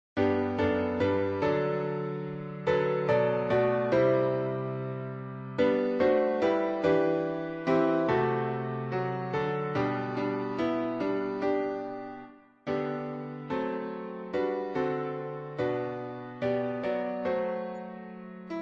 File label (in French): piano